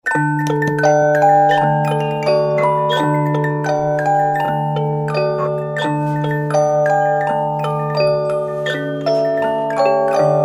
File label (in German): Kleine Walzenspieldose